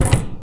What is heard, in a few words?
Furniture